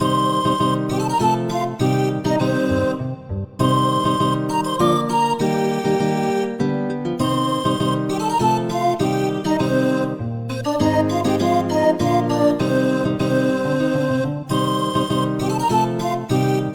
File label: Combat; u4-msdos